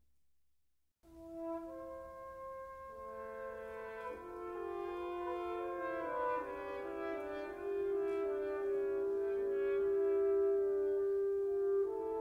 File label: sinfonia da camera opening